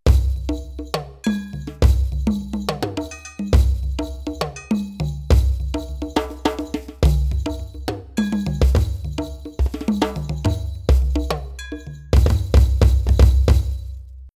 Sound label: Octapad